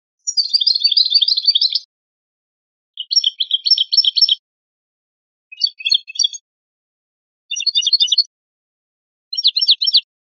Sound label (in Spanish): repertorio llamadas, campero